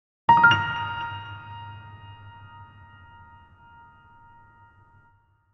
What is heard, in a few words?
Battle Victory Jingle; Piece of Cake